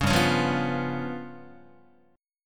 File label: G 7th Flat 5th G down Guitar; strummed; JGuitar